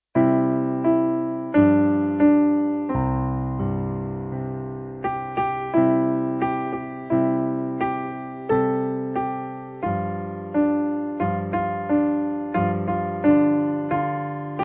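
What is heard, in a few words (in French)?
l oiseau bleu piano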